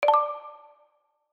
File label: goal mines choose